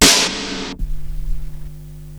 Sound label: SNARE FX, Big Beat; Big Beat Samples & More; AKAI